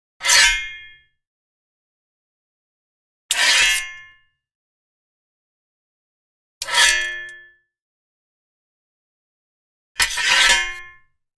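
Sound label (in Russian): clang of sword against sword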